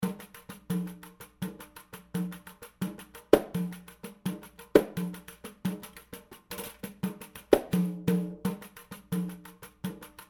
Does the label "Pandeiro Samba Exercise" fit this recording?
Yes